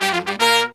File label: Pop & Funk Brass, 120